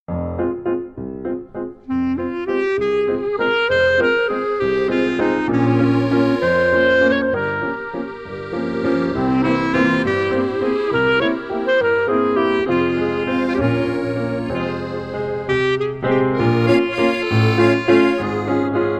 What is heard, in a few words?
French Musette